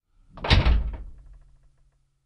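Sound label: Cutlass Interior Door Close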